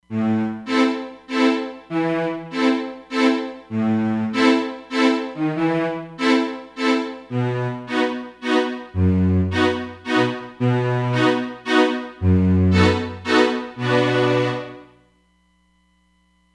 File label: Stringz